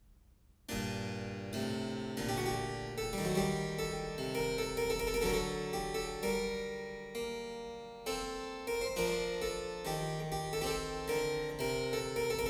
Chorale; Wer nur den lieben Gott lässt walten; BWV 691; Bach; Anna Magdalena Bach Notebook (highlights); 尼古拉斯 · 麦克吉根

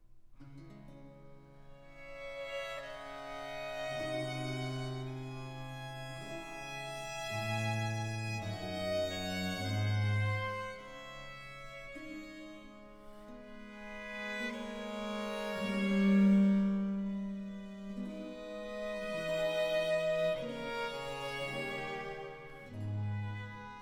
Sonata in F Major, Grave; Sonata IX; Purcell & Blow

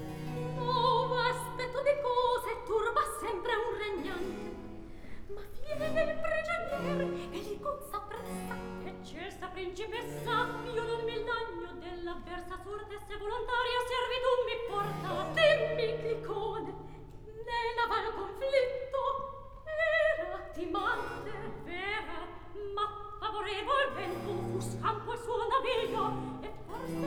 Recitativo; Act One, Scene 5; Novo aspetto di cose; Floridante

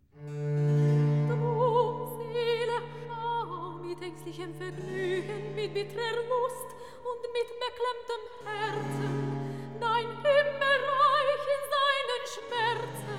Recitativo; Drum, Seele, schau; Handel; Brockes Passion

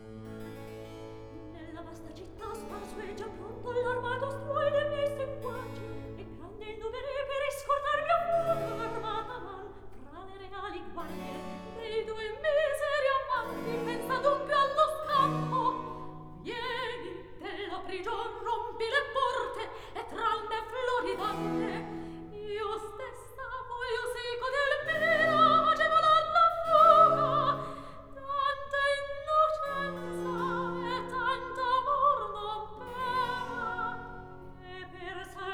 Recitativo; Act Three, Scene 5; Nella vasta, cittá; Floridante

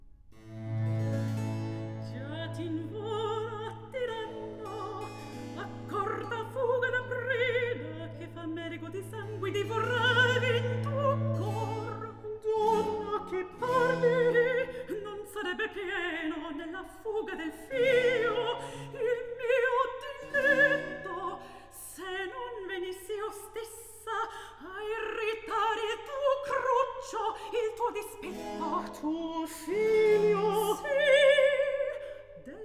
Recit; Act 3; Già t'invola; Handel; Ottone, re di Germania; Ottone, HWV 15

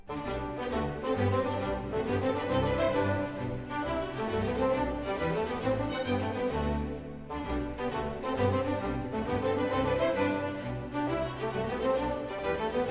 Water Music Suite in G Major; Handel; Fireworks Music & Water Music

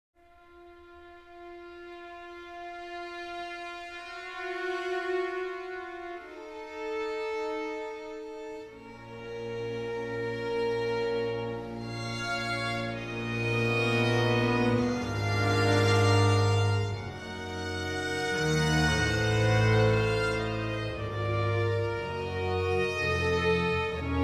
Sinfonia in B minor for strings Adagio molto; al Santo Sepolcro; RV 169; A. Vivaldi; Concertos and Sinfonias; 尼古拉斯 · 麦克吉根

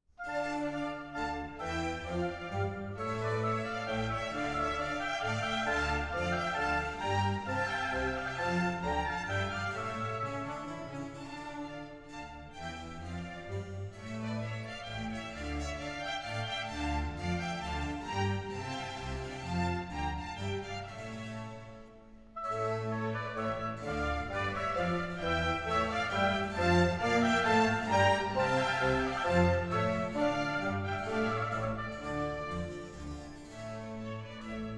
Menuet; Music for the Royal Fireworks; Handel; Fireworks Music & Water Music

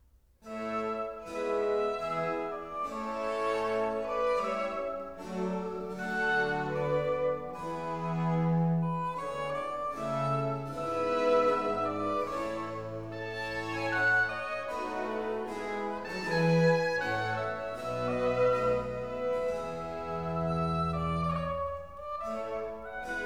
Concerto for Oboe in G Minor, Largo; Handel

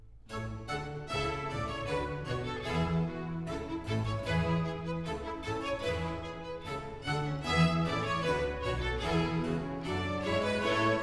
Overture (Gavotte); Handel; Ottone, re di Germania; HWV 15; 尼古拉斯 · 麦克吉根

